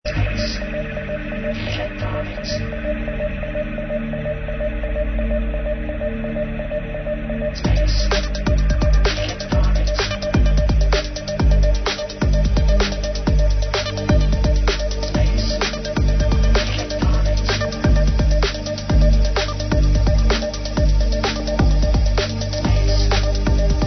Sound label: Space Electronic